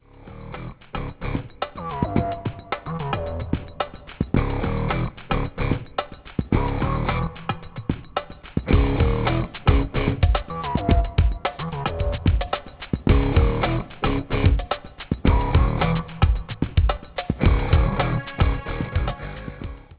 8bit, mono

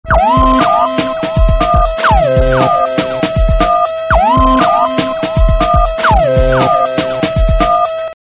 techno trance

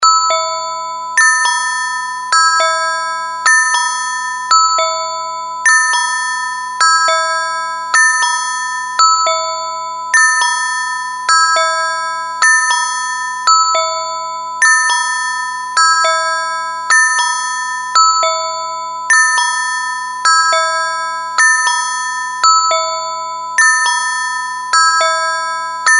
Kolokol, tones

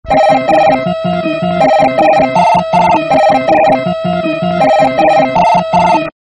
primitive melody

